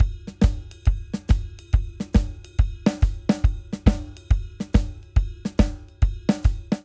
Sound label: Swing Jazz Chorus 140 bpm, Drum; MIDI Clips; Ableton; Factory Packs; Drum Booth